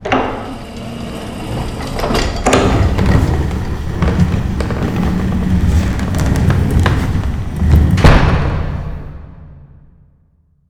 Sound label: Secret Door Open